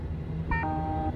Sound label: drone